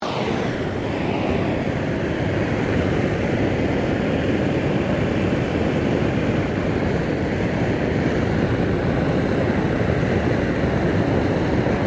TORCH GAS FLAME OPERATE ON OFF, small gas blow torch; Machinery (Sound Effects:Machines); SoundEffects-Foundation4